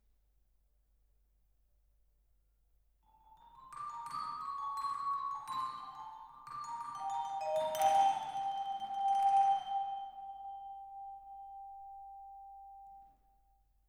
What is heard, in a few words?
Twin R Vibra